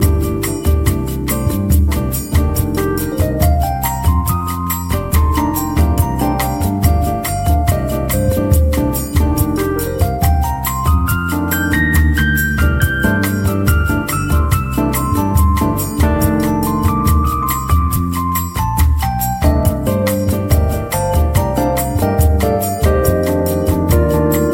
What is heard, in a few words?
Bossa